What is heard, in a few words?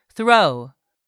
throw